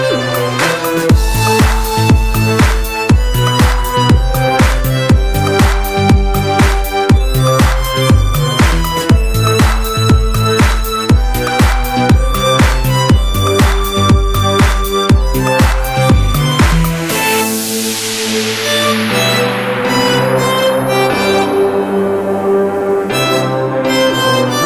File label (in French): violon